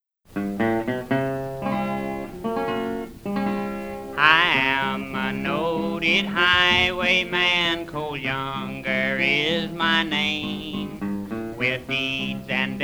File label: Ballads, American Folk Music